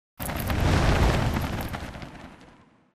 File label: atlas eject pilot ascent foley; Titanfall 2